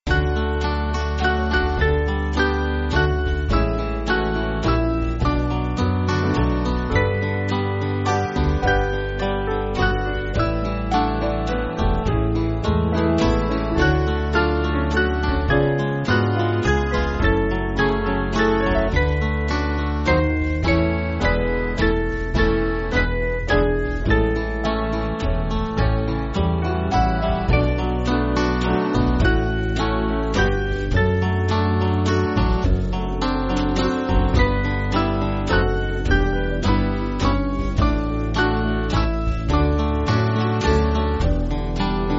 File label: Band, church music